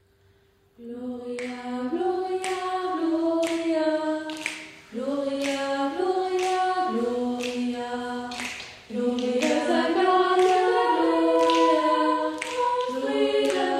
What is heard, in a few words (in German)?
Jugendchor; St.Georg